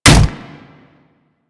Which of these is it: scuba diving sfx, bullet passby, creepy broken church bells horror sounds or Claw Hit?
Claw Hit